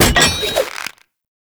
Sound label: Rope Winch Rope Latch On; Puzzles